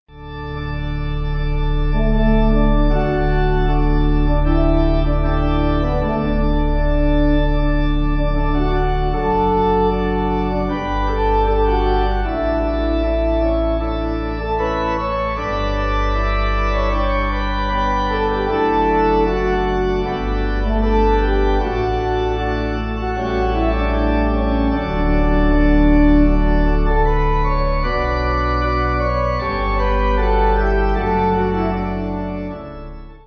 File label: Pipe